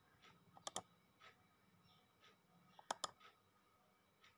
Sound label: Mouse Click Sound Sound Effects